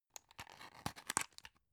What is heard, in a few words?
drug open soft; arzt